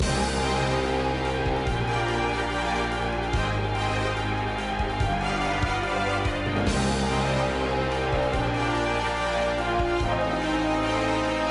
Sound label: theme loop